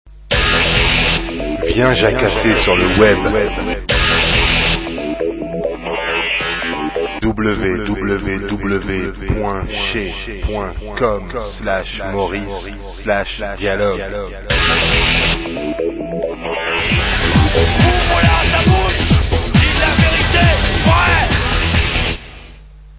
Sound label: jingle